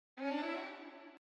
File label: run